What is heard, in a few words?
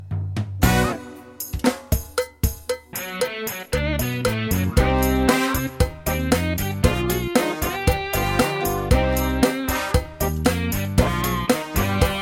Latin Rock